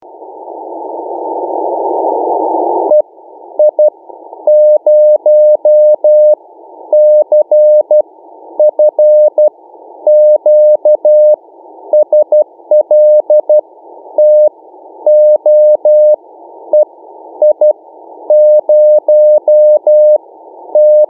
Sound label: beacon